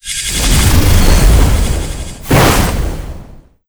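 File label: spawn, monster; mazel1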